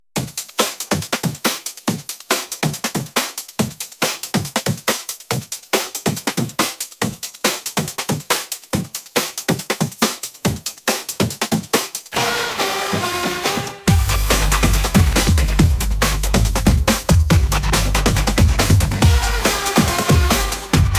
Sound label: break it down bass